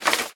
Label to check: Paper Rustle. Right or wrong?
Right